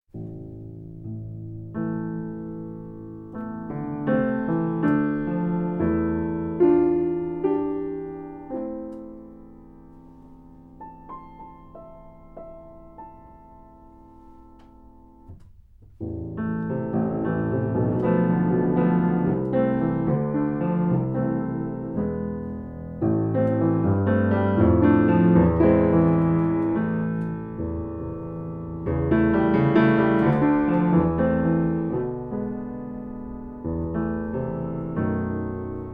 Piano Solo

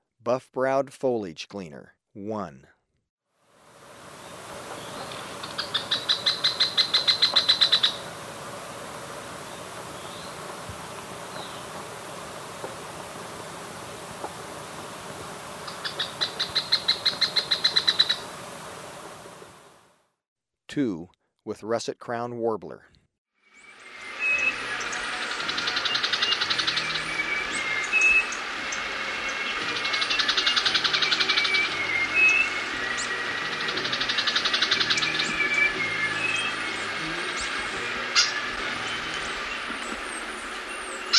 Buff-Browed Foliage-Gleaner, Birds of Ecuador; S. America

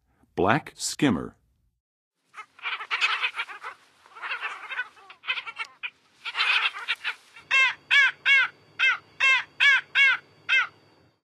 Black Skimmer, Birds of North America; Western USA; Western USA Songbirds

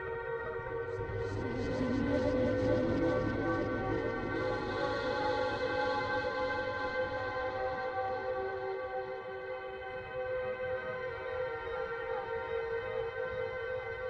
SCI-FI

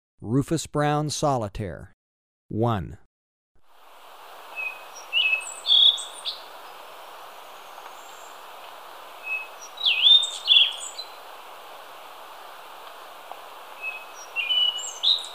Rufous-brown Solitaire, Birds of Ecuador; S. America

